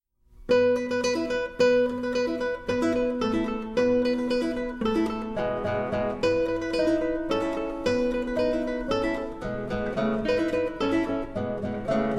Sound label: Toccata Fanfare